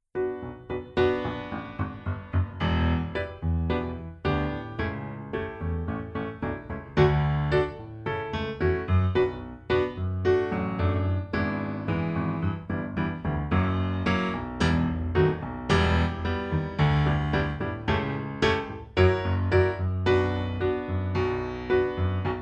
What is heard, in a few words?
Piano